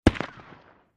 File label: 50 Cal Pistol Shot From Distant Point of View